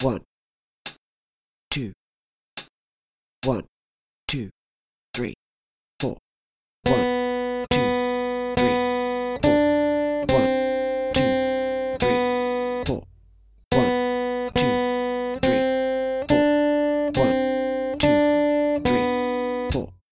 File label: B string, guitar